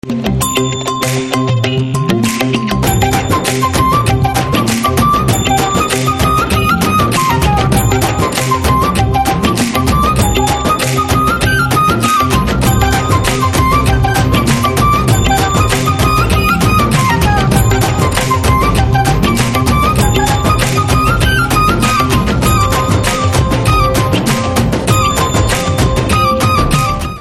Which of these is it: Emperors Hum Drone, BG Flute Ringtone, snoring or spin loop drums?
BG Flute Ringtone